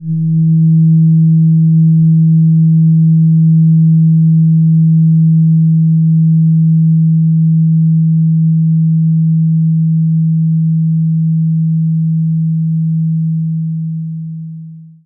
fork; Mid

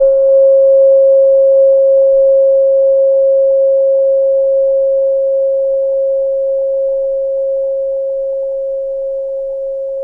chime Mid, hand chime middle

